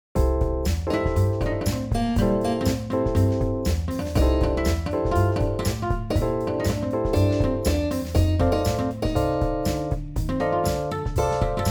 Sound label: MIDI blues; spellman studio